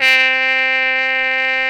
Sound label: C4 C, BRASS; AKAI S-Series CD-ROM Sound Library VOL-8